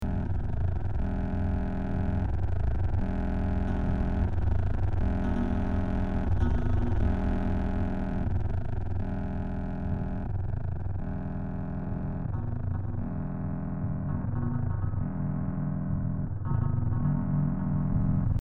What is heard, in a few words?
amb